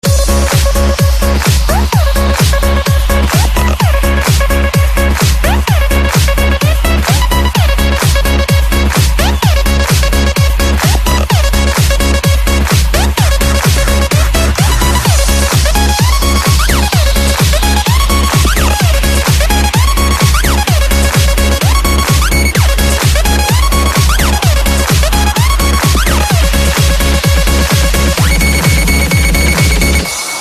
house electro

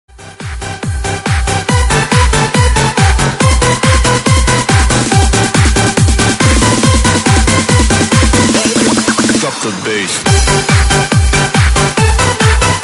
drop the bass

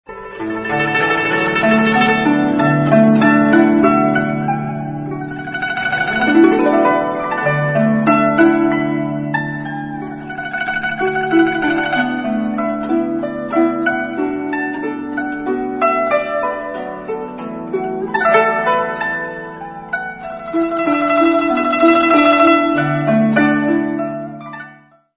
gu zheng

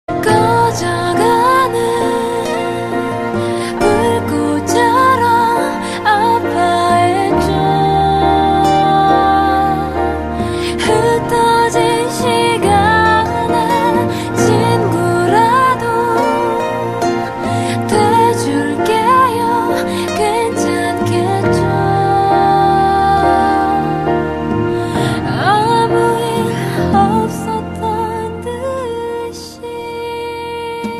piano

